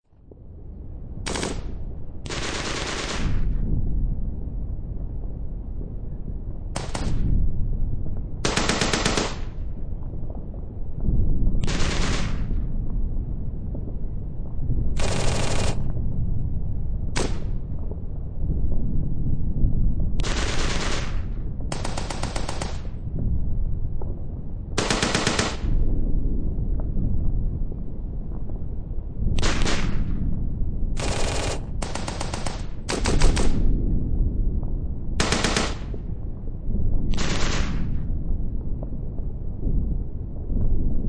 Warfare World War Two Battlefield Gun Shots Distant Explosions; WWII; LYN083 Warfare; Explosion Sound Effects